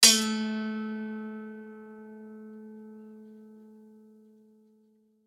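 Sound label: zither string plucks contact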